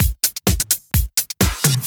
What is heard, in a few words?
Housebeats 128BPM; Vengeance Samples